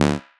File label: Techno Synth Shot D; Synth One-Shots; Ghosthack; 45 Free Techno Synth Shots 2025